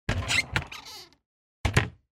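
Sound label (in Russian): variant with a creak of wooden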